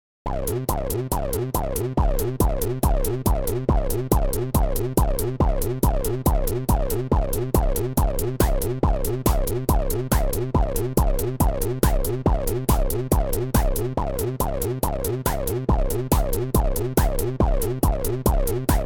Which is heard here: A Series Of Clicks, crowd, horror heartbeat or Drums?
Drums